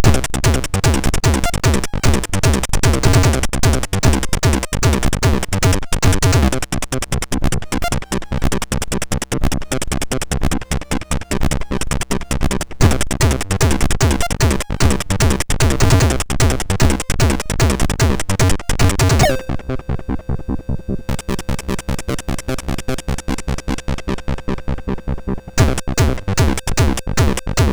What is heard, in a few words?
Techno Rock, sid MOS6581R2